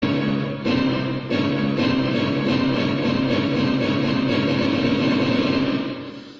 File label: intense drum